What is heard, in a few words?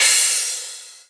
drum hit finish; osu